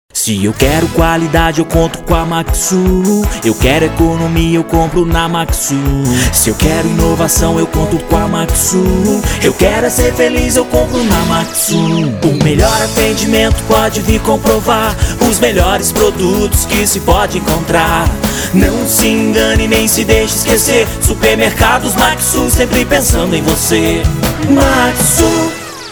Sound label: jingle